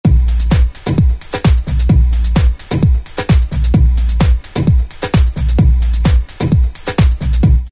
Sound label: Jazzy 130; Dance; Roland SR-JV80-06